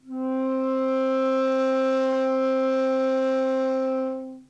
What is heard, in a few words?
Flute C4